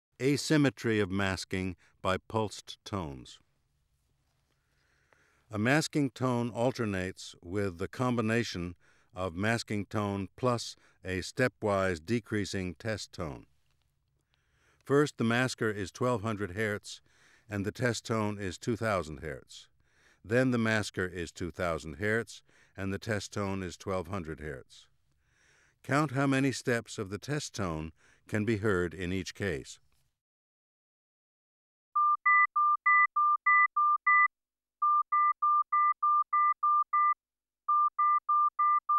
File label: Asymmetry of masking by pulsed tones